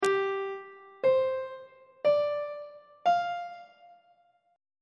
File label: g dominant 7th suspended 4th chord intervals on treble clef